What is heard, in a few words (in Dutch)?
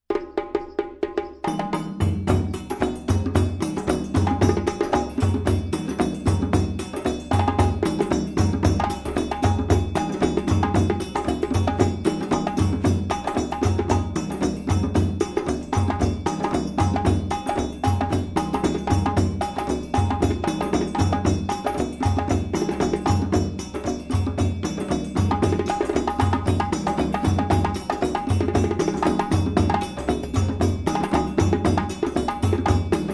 Moribayassa Volledig, djembe